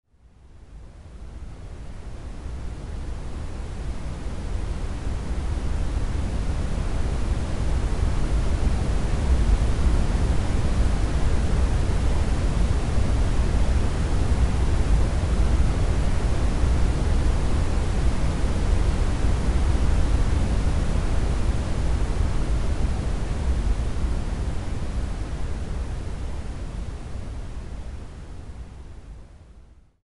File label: grey noise; sound oasis